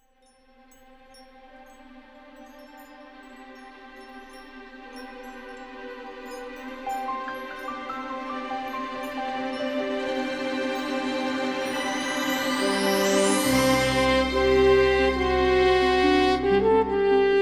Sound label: Orchestral